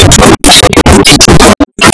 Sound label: Choir